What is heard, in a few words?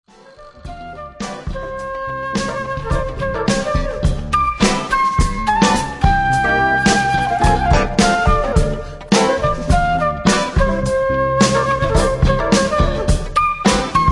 Groove